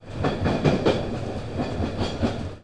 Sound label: Point, Train; OpenBVE